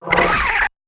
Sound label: Attack; Bobbit